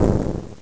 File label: medium explosion; Powermanga